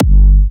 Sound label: Slobber G4, Kicks, Big Room Kicks